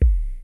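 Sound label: bass drums, synth drums; Syntec; Wall of Sounds; TECHNO-BOX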